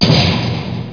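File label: prison door; JOHNNY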